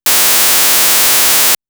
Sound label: hpf Resp A